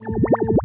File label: Sweeping Drone